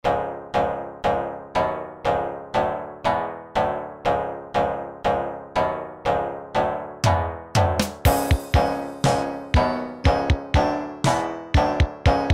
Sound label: ROCK